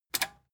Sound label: metal button tiny click disable fast